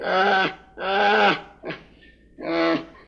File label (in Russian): ispugannij gromnij ryov medvezhonka